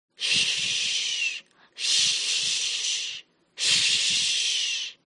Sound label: Long Shhh